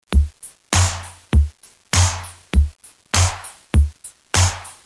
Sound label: depressing rock music